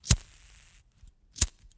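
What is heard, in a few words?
lighter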